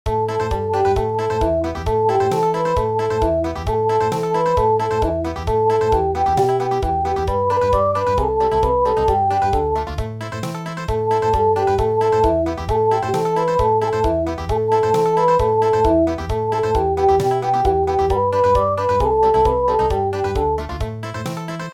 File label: Country